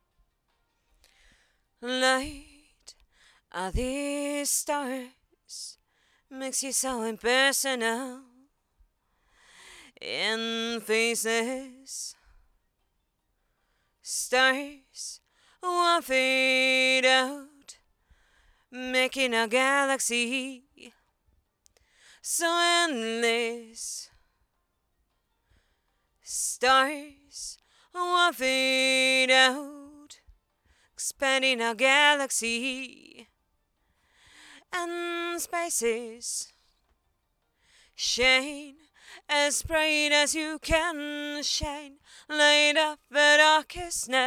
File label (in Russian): Zhenskiy vokal, Petlya superkardioida, napravlena na istochnik zvuka; OWS U1200DL